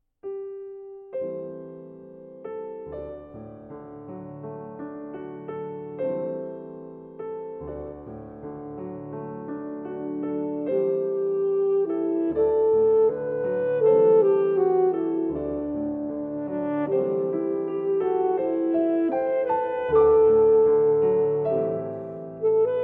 Studies in English Folk-Song Lento

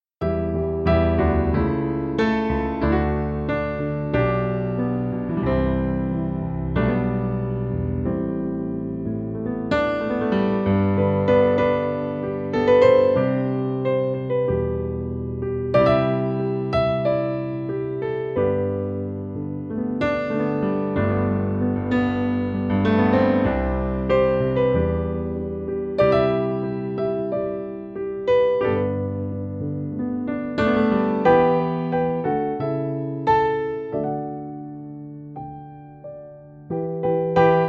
Piano Version

